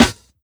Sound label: sharp steel snare drum b key